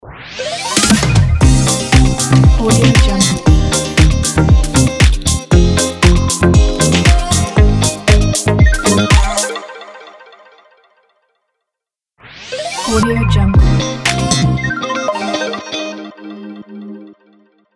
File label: fashion disco ident